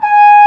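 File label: French Horn mf G#4; Digital Sound Factory Virtuoso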